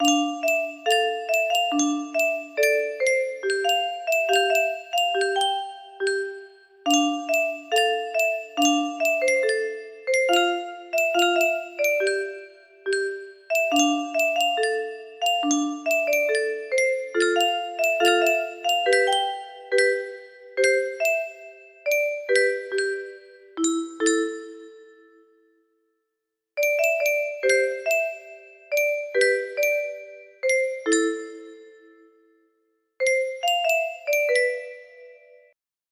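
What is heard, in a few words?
15 notes, music box melody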